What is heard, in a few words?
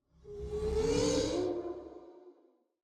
ghost audio; c2c